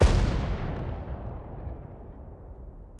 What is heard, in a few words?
MOVE; C64 Dreams; Multi-Sound Default